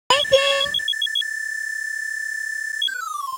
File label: Transformation Sound Voice; Wonder Momo Original Soundtrack